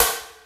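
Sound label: Hiphop snare, Roland JD-990; Drum Samples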